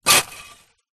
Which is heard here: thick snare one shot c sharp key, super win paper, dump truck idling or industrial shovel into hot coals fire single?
industrial shovel into hot coals fire single